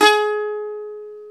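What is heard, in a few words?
ethnic plucks, saz; Eth Plucks, Pluck Duo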